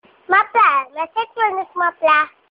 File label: message sound; tin nhan